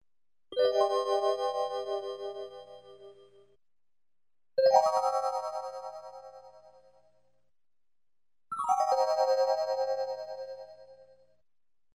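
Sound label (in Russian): синтезатор загадочный тон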